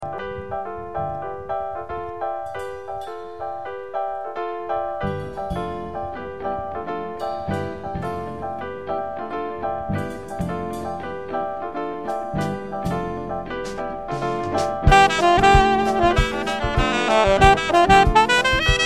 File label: shaker